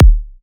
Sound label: Kick D, Psy Kick; Drums; Ghosthack; Ghosthack Advent Calendar 2017 Day 9 - Psy Kicks; Ghosthack 20 Free Psy Kick